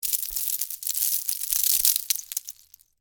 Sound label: Debris Penne Rigate; Texture; BlastWave